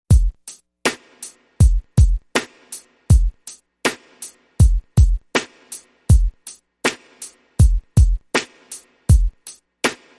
Drum Loop

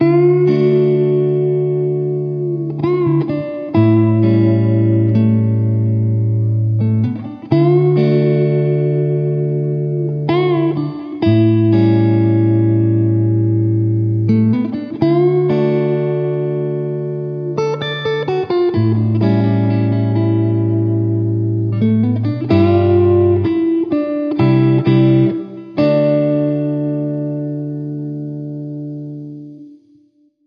Gentle Along The Way, No Drums

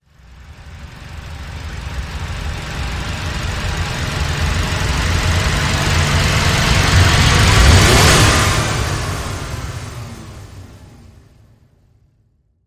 Speedway Rise

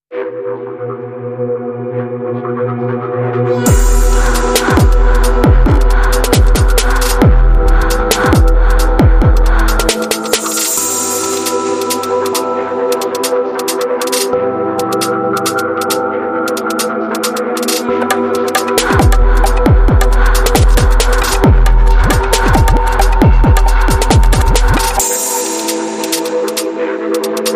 Glitch Step

